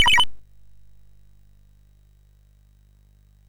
Power of Black Bandit, MOS6581R2

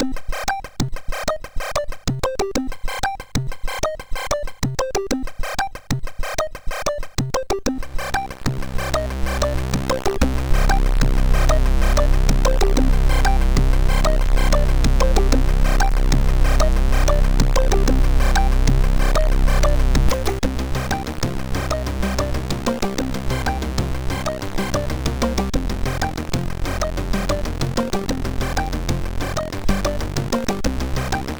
Smack it, MOS6581R2

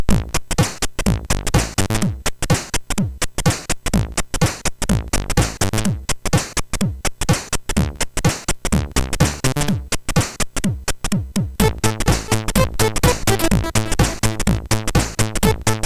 Dusty Metal, MOS6581R3; Early Tunes

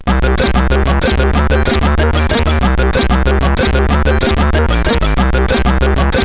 funk level, CSG8580; CSG8580R5; Orcus; hvsc

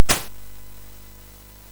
SFX, MOS6581; Narco Police; MOS6581R4; hvsc

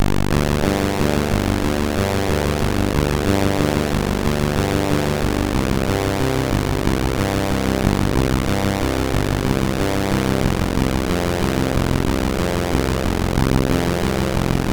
sid MOS6581R3, GAMES; Cubis

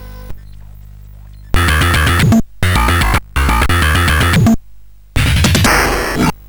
Microtuned; sid; CSG8580R5; hvsc

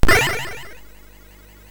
Bomb Chase Revival SFX, sid MOS6581

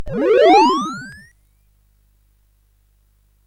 SFX; CSG8580R5; Narco Police

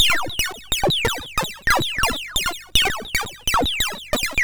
Project Firestart, MOS6581R4

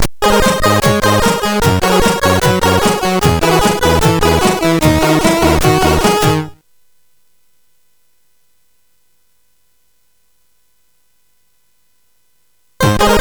Aplause, sid, CSG8580R5

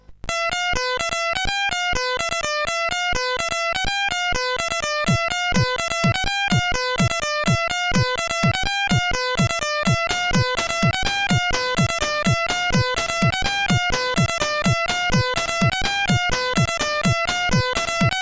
sid CSG8580; Technonoise

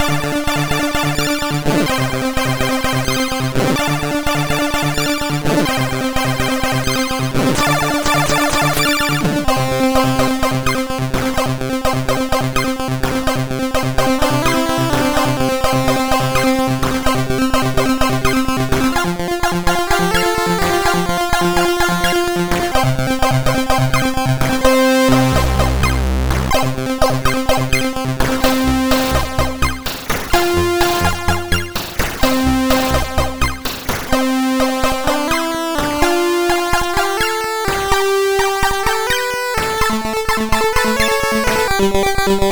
Rap, sid MOS6581R2

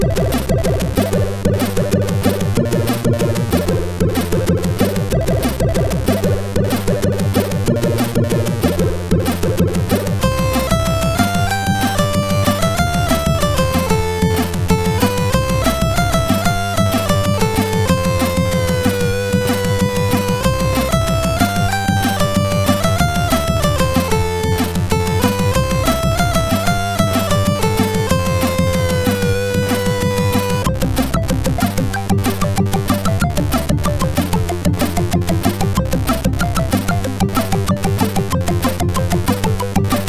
Lame Over tune, MOS6581R4

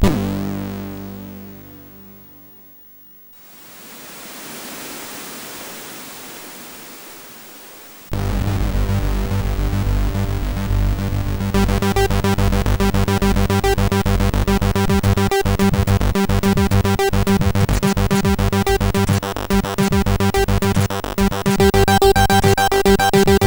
Minimalistic Tune, sid MOS6581R4

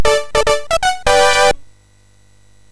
Fast Sfx, MOS6581R3; hvsc

